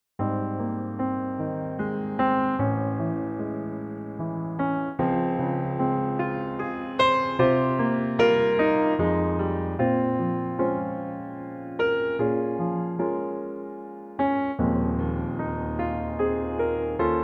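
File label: Ab piano, male; key - Ab - vocal range - Eb to Bb (huge range); waltz tempo (3/4); Pianotrak 2017; Gorgeous and hugely popular song in waltz tempo (3/4), and in a lovely 2017 Pianotrak arran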